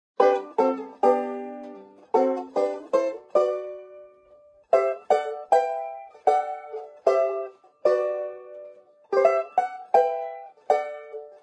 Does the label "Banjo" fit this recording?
Yes